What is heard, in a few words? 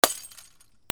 BREAKING